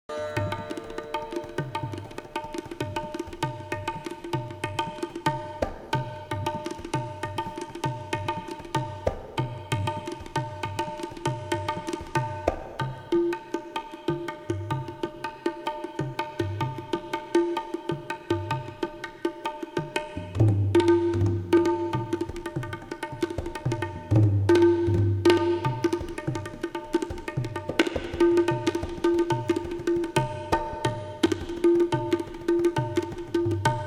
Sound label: Tabla Solo